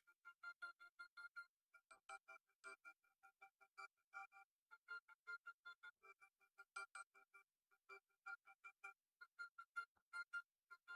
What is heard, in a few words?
Keyboard